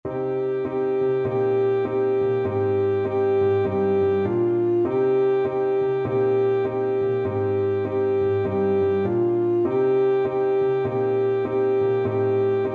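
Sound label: birds flyin high alto saxophone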